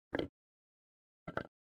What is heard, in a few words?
pick up and drop standard; baldursgate